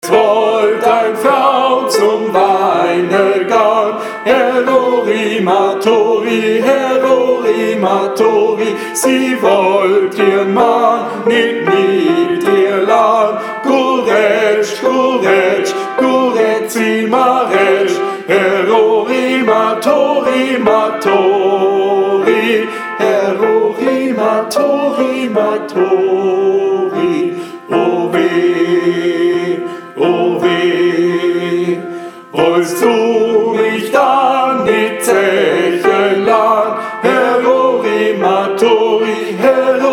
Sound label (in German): TENOR 1; Gesang; Es wollt ein frau zum weine gahn